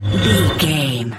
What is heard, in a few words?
metal scrape deep grind squeak